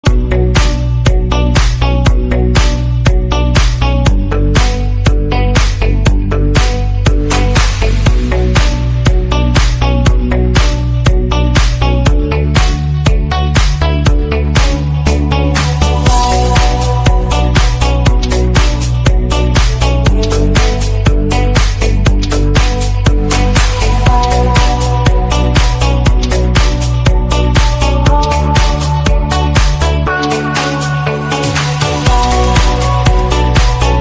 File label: shuffle dance original mix